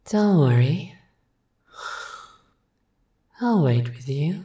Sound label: snoring; rosalina; SM64 CO-OP